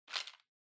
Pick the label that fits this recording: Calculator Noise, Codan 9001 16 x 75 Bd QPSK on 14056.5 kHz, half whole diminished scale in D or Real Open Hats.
Calculator Noise